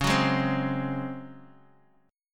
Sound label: Db Major 7th Suspended 4th Db down Guitar Open D